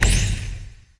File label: boss efreeti snapping